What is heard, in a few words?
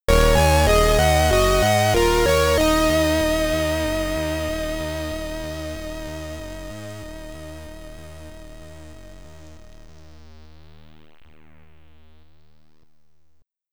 Game Over (SID Stereo), Commodore 64; Cyrus